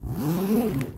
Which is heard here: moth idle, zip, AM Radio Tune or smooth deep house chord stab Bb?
zip